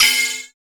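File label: PER PROCU, Procussion, percussion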